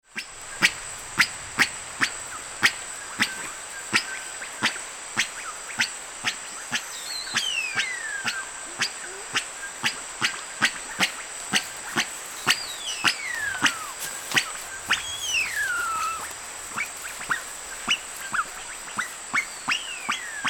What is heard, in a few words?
Black curassow; پرنده کاکل فری سیاه